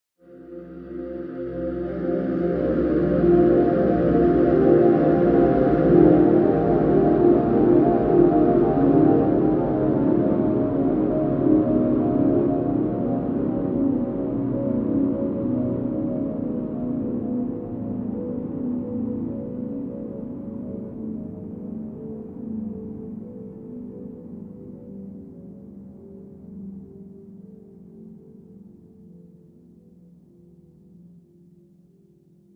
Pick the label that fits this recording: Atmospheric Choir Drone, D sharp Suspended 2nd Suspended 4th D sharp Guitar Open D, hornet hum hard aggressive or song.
Atmospheric Choir Drone